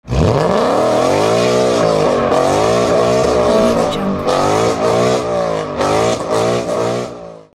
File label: sport car tire burnout